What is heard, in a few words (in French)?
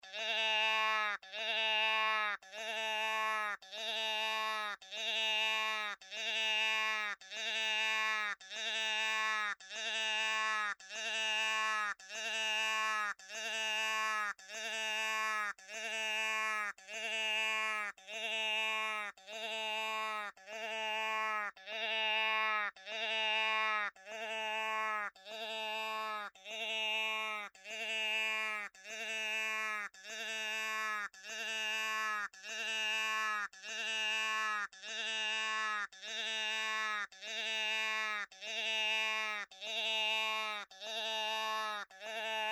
Vache